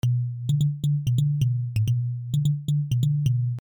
Trap Loop

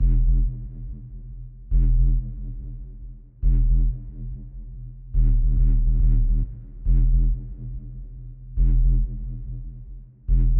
Bass Loop

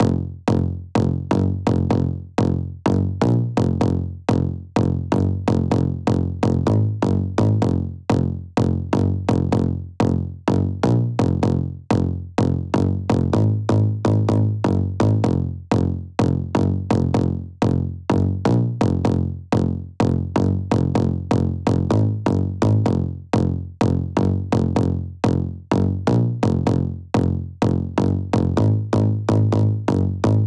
Synth Bass, F, 126bpm

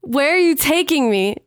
vocal where are you taking me 90 B maj

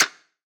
Perc

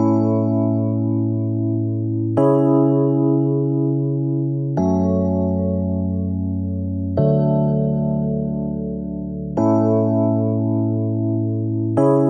Rhodes A 100 Wet

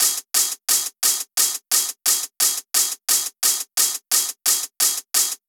TOPS 175

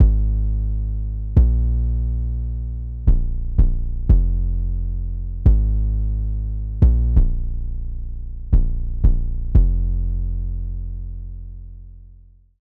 bass

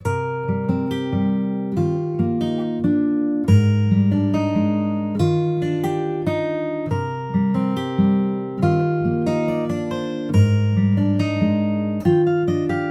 Sad Acoustic Guitar 150bpm A minor

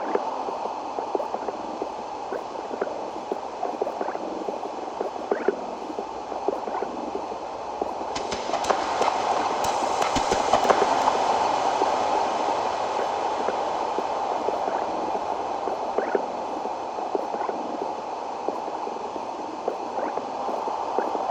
Interference

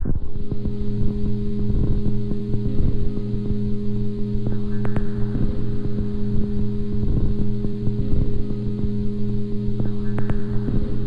Interference Bm

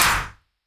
ONE SHOTS TRASHER WITH ROOM AND FILTERED

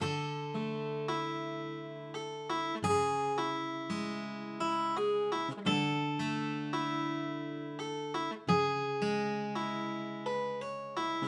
A 168bpm pluck; Bleu Moon

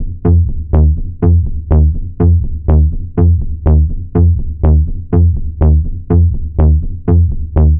123 Fmaj Filter Synth Bass

